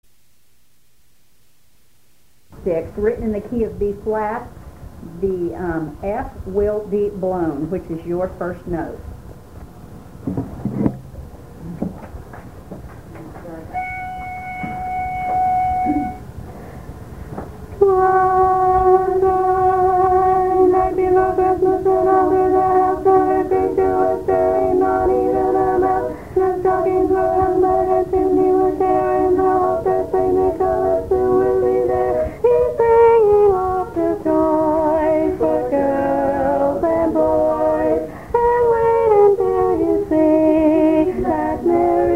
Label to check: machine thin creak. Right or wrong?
Wrong